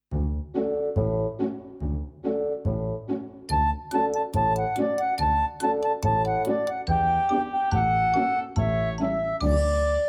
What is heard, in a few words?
Melody